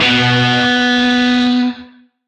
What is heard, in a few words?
Shawzin B; Warframe; Lizzie Shawzin